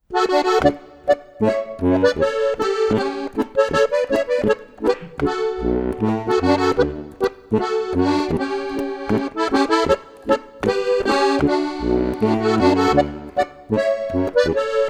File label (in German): Mitternachtsboarisch